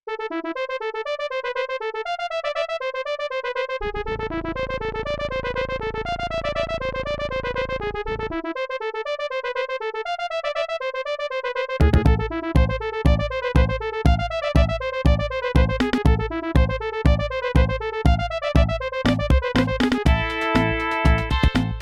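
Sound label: credits, IBM Music Fature Card; soundcard test; Silpheed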